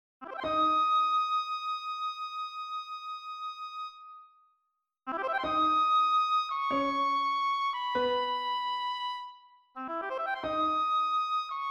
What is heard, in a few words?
Oboe Sonata